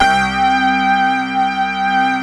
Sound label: ATTAK MOG C2, Analog Fantasies, MOOG; USB Soundscan vol.49 - Analog Fantasies; AKAI